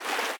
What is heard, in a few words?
fast water, river sounds